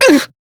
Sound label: Pain, Male; Dialog; Warframe; Railjack Crew; Perrin Sequence